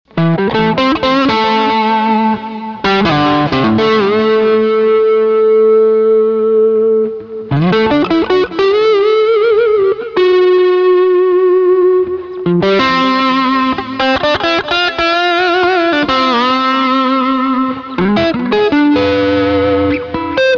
Fender Stratocaster EHX Soul Food Stereo Memory Man Roland JC120 x2